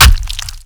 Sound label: gun body impact